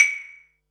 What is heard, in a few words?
Perc, Percussion and FX; Pearl SC-40